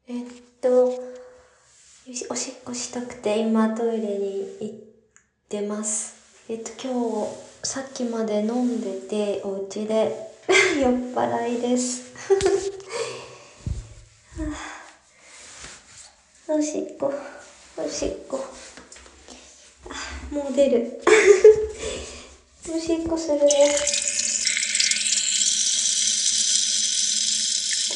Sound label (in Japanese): お酒、飲んじゃいましたッ! おトイレで! オシッコですッ!